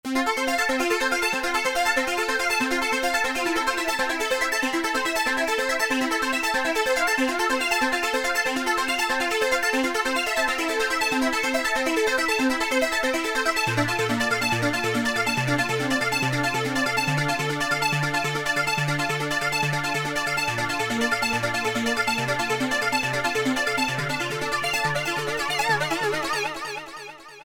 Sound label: Synth, Korg MS2000; Factory Patches